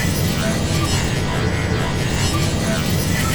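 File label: spell illusion travel loop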